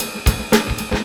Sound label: Sloed gruve, Synchro Funk, Beats; Sonic Foundry (Sony Creative Software)